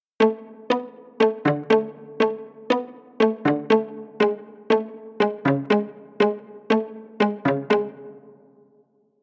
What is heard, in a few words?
Melody Pluck Folk Indie; midi wizard